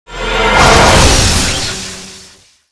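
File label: buff skill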